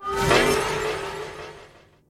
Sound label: teleport